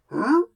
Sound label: Bush Antic; Mk Bush Antic